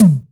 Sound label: quebob, Toms Electro